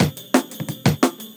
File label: 175 D&B Drums; Drum n Bass; Pro Tools Essential Loops; Digidesign; Dance DJ